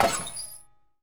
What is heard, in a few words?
Cage Stop